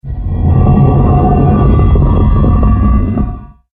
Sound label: Secret Stone Door